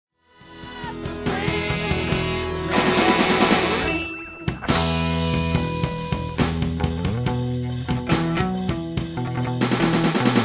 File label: bass practice